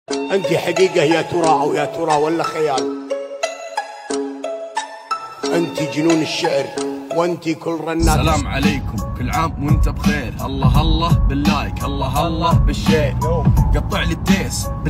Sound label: Arabic remix